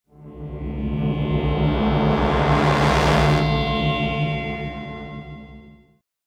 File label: Transition; Station